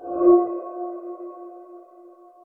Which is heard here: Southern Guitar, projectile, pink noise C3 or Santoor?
projectile